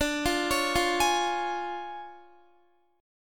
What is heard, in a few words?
D Minor Major 7th Flat 5th, D, Guitar; DmM7b5; arpeggiated